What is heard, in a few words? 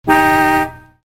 Car Horn